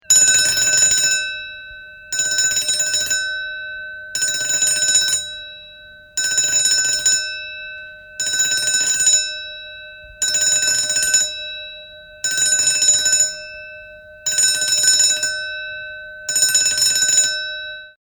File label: Alarm Bell Intermittent, Alarm Bell - Intermittent ringing; Electronics